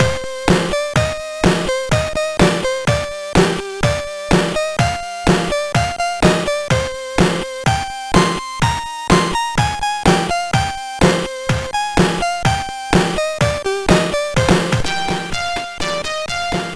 Russian digimix; MOS6581R3

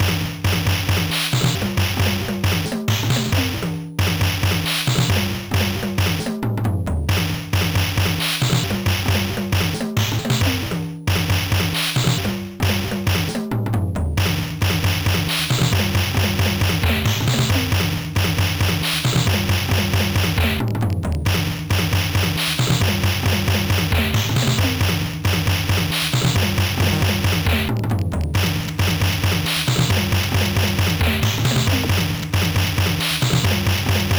guitar; Sonix Music Driver

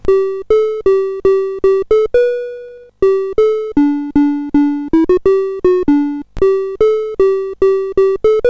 BASIC, Commodore; C Prog Ref Guide example 03; CSG8580R5

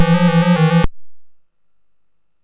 sid MOS6581R4; Frogger II-Three Deep

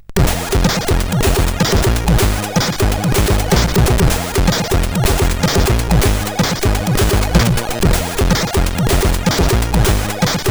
House, sid CSG8580R5

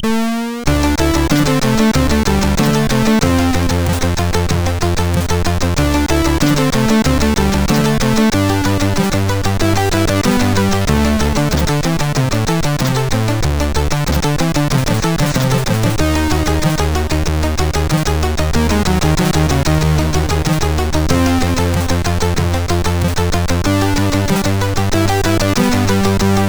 sid MOS6581R3; GAMES; Bod Squad

